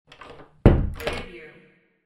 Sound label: door close